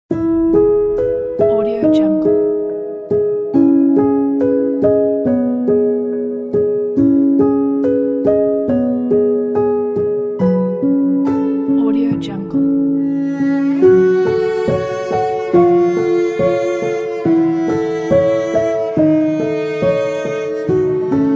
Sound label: family piano